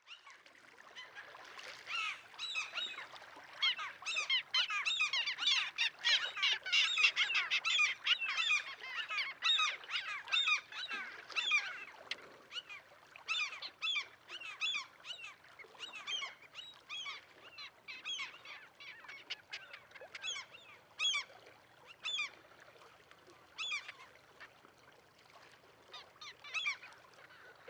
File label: Mew Gull, Larus canus; gulls terns